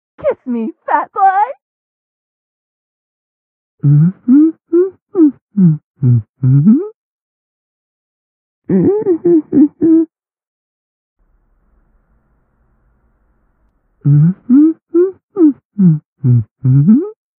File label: Clown Behind Door